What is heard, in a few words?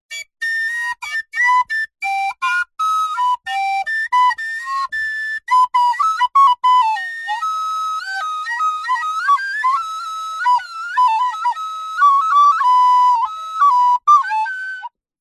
musical instrument recorder playing badly out of tune